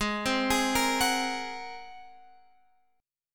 G sharp 9th G sharp Guitar Open F; G#9; x,15,12,15,13,13; arpeggiated

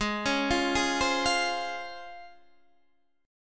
Db Major 7th Ab

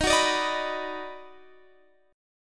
Eb Minor Major 7th Sharp 5th Eb down, chord; EbmM7#5; strummed

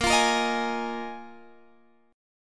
A sharp 7th Suspended 4th A sharp, Guitar Open G

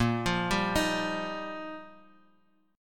G Minor 7th Sharp 5th; chordshape; 6,8,5,8,x,x

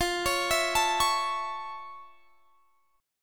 F Augmented Major 7th F; F+M7 arpeggiated; x,20,23,21,22,21

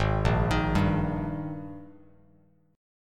Ab Major 7th Suspended 2nd Ab down Bass Standard; arpeggiated

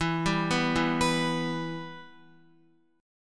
E-Minor, Guitar; arpeggiated; JGuitar

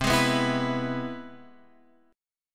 C Augmented Major 7th

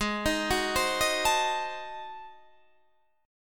G sharp 7th Flat 9th G sharp, chord

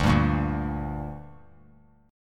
D Suspended 4th Sharp 5th D down, chord; strummed

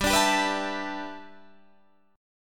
G 6th Add 9th

